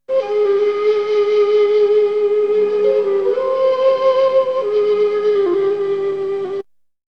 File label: Flute; ZERO G - Datafile DISC 1